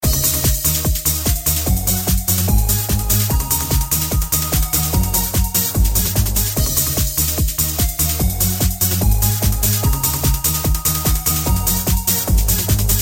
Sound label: menu music; atomic bomberman